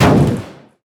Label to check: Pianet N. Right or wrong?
Wrong